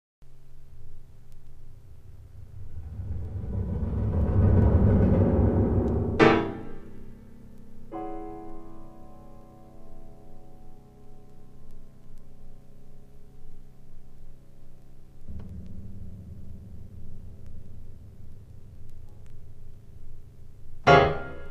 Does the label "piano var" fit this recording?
Yes